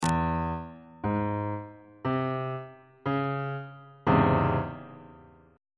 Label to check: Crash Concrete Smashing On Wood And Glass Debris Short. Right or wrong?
Wrong